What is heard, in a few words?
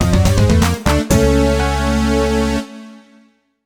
Bowling Miss; super monkey ball adventure